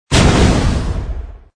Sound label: bullet dead; croods